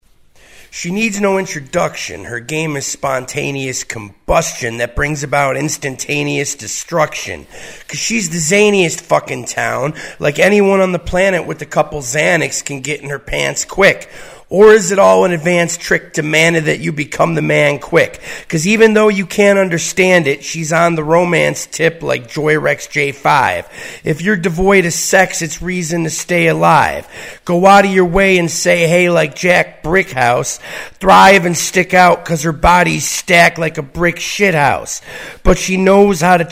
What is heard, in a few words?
dirty bird vox, acapellas